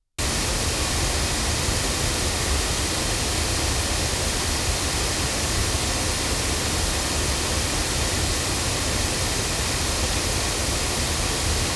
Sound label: MT97 8 loudspeakers; TSM